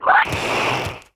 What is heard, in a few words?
Elder war damage